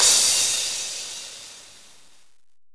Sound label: Crash; Electro Flux Sound Kit; ED Crash